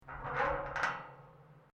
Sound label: GATE METAL LARGE CREAK, Large metal gate; Gates (Sound Effects:Doors)